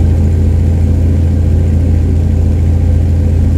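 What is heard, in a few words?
stuka cockpit right, engines; War Thunder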